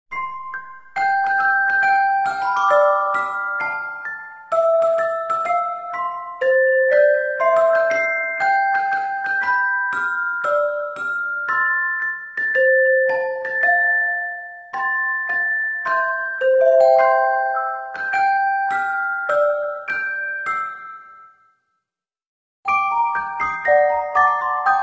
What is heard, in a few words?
The Music Box; Wallace Gromit's Grand Adventures; Xbox 360